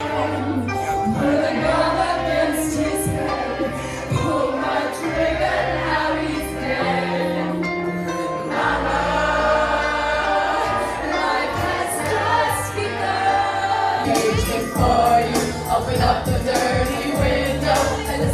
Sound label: OFF KEY KARAOKE; SYDNEY SWEENEY